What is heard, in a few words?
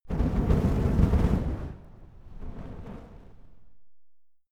flamethrower medium whoosh single